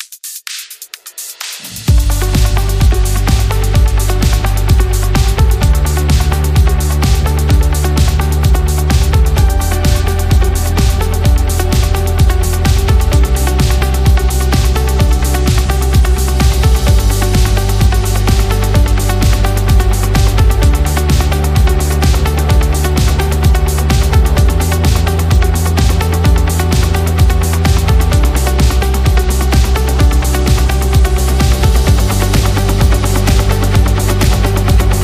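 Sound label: Creating Success, motivational corporate music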